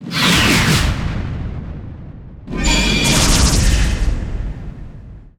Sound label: shot hit